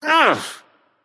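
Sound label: non verbal ouch, voice; cernunnos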